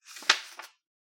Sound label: shuffling papers; soundscrate